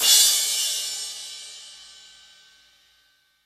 Long Crash One Shot A Key, crash sound tuned to the A note. Loudest frequency: 6222H; cymbal drum samples